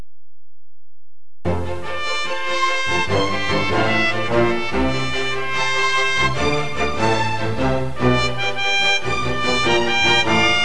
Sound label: jingle